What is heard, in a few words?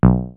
DISTORTION BASS